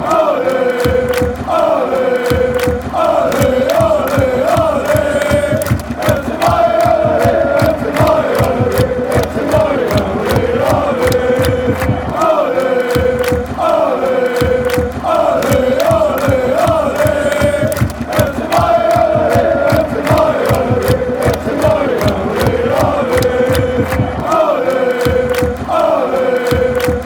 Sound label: allez, fan chants